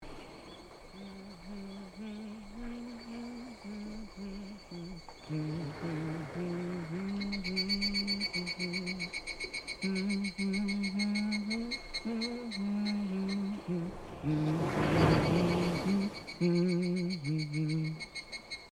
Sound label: hum